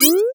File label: Beep High Pitch; Multimedia Internet CD-Rom Flash